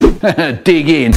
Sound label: haha; dig in meme but fnaf